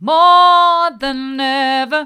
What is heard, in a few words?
more than ever hard, vocal